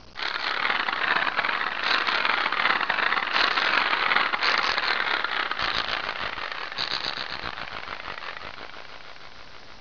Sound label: CRACK; Talking E-Mail